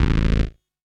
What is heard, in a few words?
KEY SHOT TEKNO 140 A; Techno2; Singleshots